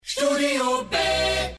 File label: Opener Acapella; DRS 3; studiob